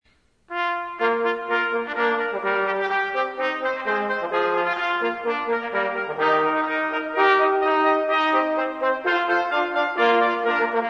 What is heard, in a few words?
fanfare; despres